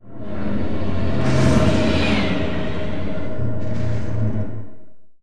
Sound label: Robot Arm Track Pass By; Boomtown; Titanfall 2